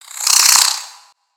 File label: Spider Angry, creatures mechanical; Lego